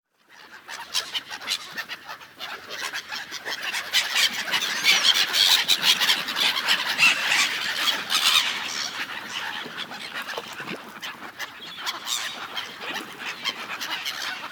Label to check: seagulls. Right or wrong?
Right